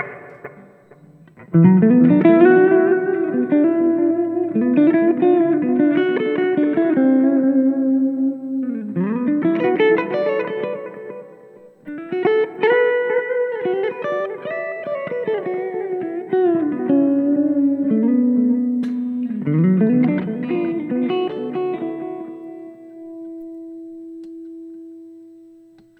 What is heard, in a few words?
Warm Clean